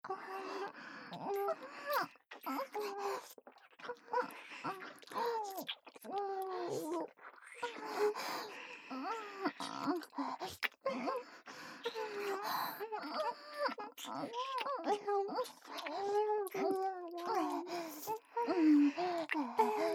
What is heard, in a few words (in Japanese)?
レズディープキス; 小春と美冬